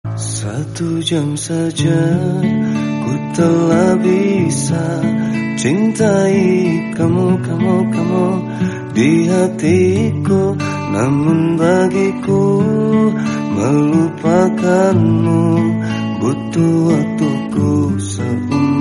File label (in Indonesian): tua sedih nada dering indonesia